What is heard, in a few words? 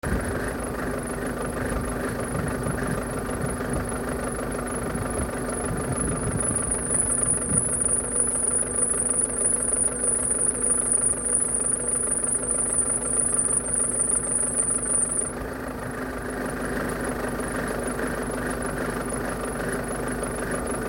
vito 113 cdi 4x4 bus cold start squeaking noise cold start w cabin heating; v-class